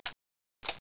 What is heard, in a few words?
camera; 12000 Graphics Pack - A Complete Graphics Collection For Your PC